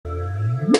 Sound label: graviton sound